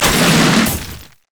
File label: rock pulley down; LoL; emitters map8; odin